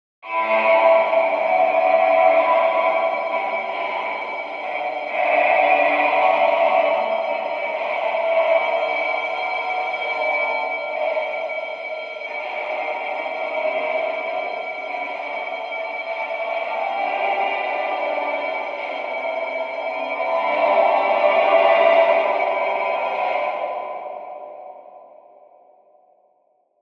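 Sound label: mangled reversed distant filtered piano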